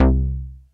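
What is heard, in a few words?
SYNBASS, synth bass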